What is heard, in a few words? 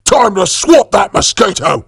hurt; tf; saxton hale